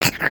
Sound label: Trex Running Growls; creatures organic